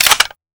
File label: clip out; type19